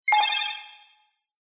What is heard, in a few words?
game sound swell collect bonus item